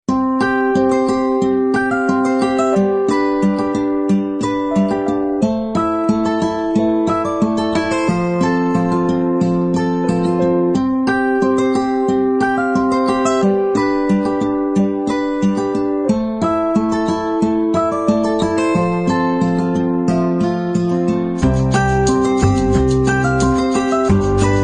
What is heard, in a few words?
Acoustic Peaceful Folk; AdobeStock